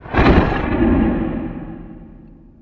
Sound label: stomp; satan